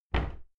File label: cupboard close normal